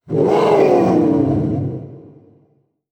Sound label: monster; MonsterVoiceお手軽パック